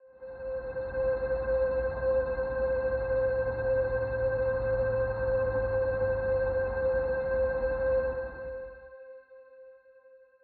aetheric solo pad

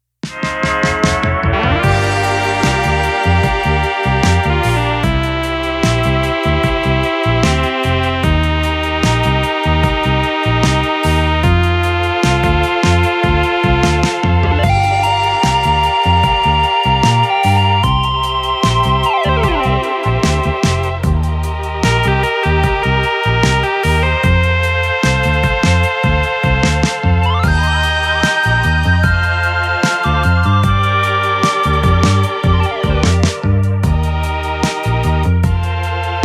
novachord solovox

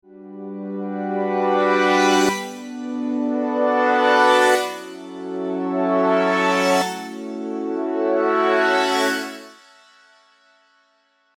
serum chords; chiqui audio

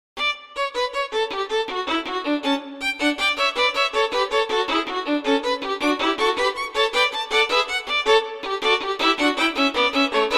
viola spiccato

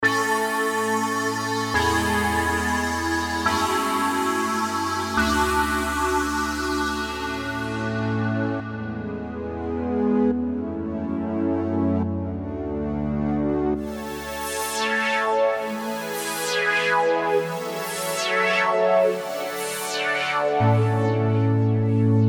pads; wired

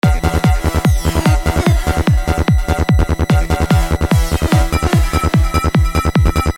goa vs psytrance

